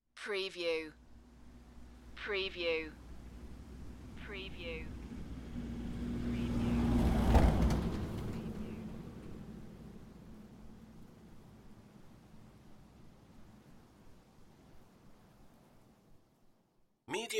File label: Farm vehicle and trailer drive by on country road in Wales; beanstalk audio